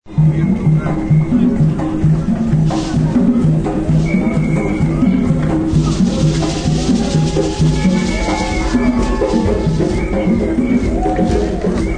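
African Drums Party, African drums are pounded at festival as crowd cheers and dances.; Bars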